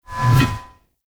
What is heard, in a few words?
Shield Whoosh Heavy; Weapons; Enemy; Shields; Sinecure Shield